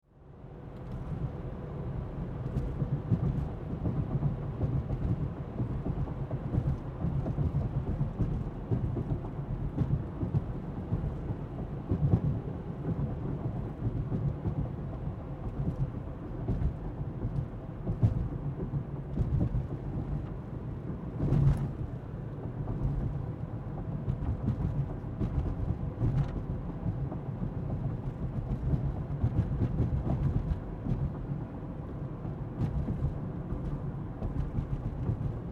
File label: Auto Drive Interior Automobile Car; Vehicle; Cars; Vehicles